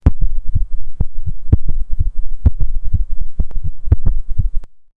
heart beats organ

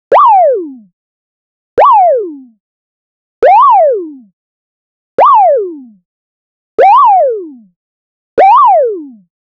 a quick cartoon style upw

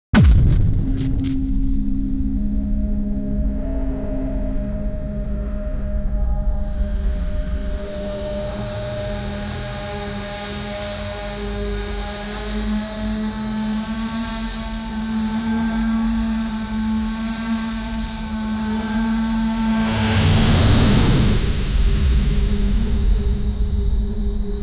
colossal spacecraft ignit; atmospheric pressure; SFX Engine

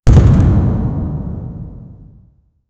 put a heavy tower down; building

